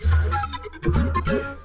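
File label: Music Dpad 145 Maxisphere; from dust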